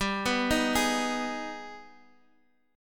Ab Minor Major 7th

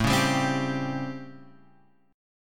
A 7th Sharp 9th, Guitar, Open F; A7#9; strummed; JGuitar

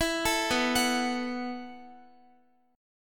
B 7th Suspended 4th B down Guitar

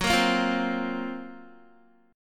Gb Major 7th Flat 5th Guitar; GbM7b5 strummed; JGuitar

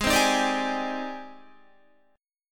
Ab Major Sharp 11th Ab down Guitar; AbM#11 strummed

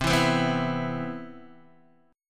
C Major 7th, Guitar DADGAD

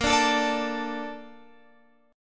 A sharp Major 7th, Guitar Open F; strummed

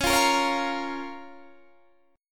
F Suspended 4th Sharp 5th C sharp

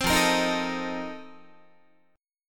F 11th F, down, Guitar; F11 strummed; 15,13,13,13,13,14

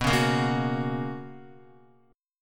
B Major 7th Suspended 2nd Guitar, chord; strummed; JGuitar

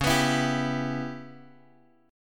C sharp Minor 7th Flat 5th, Guitar DADGAD; 11,14,14,12,x,x

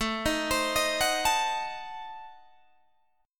A Diminished 7th A down Guitar Open G

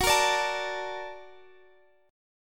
A sharp Augmented Major 7th

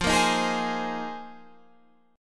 Bb 7th Suspended 2nd Suspended 4th F

